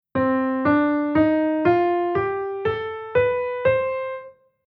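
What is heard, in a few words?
Chord mode C Melodic Minor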